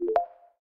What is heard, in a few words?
Usb; OnePlus; ui; OnePlus 12